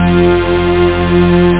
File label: analog; tb303 samples; synth; Amiga 8-bit Sampled Voice; Mecomp Multimedia 1